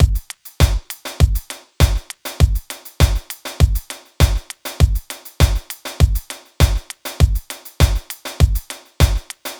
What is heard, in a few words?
100 Pop Drums 4 Floor; Pop Rock; Moderate Tempo; Pro Tools Essential Loops; Digidesign